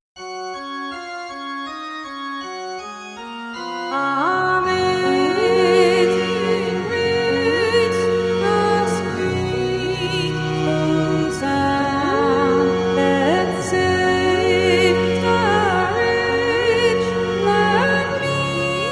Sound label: vocaloid